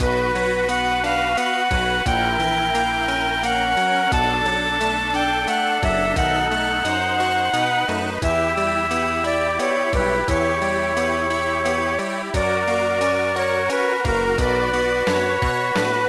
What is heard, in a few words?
BGM; Zoids Saga Fuzors; gameboy advance